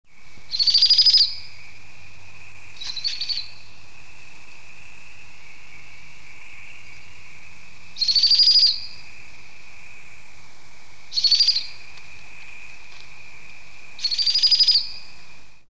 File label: ninox strenua